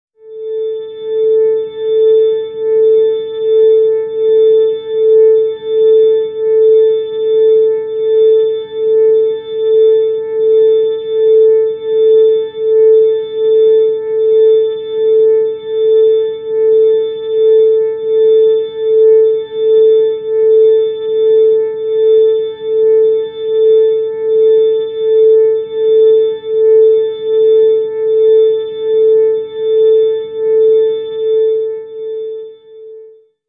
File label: organ tone a